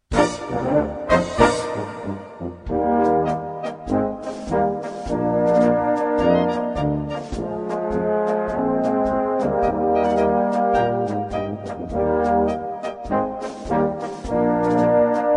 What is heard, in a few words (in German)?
scherzachtaler polka